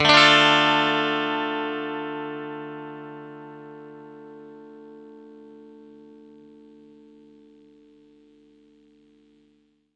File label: clean chord Dm, clean electric guitar chords